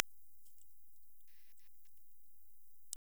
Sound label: Pipistrelus pipistrellus; Ormea CN